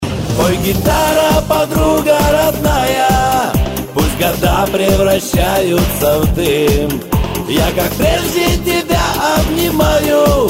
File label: gitara